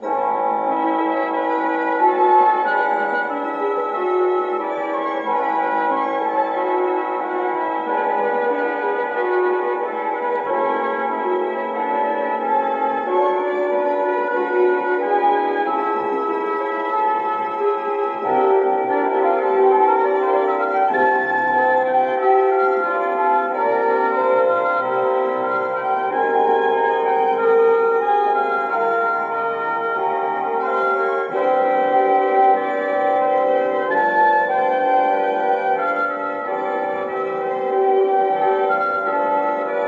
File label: synthscore; It Came From Outer Space; End Cast